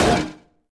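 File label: impact sword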